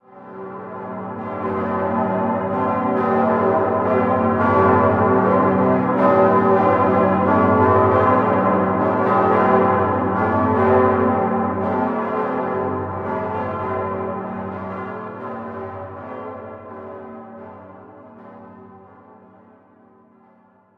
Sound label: ambient port; World Of Warships; port3d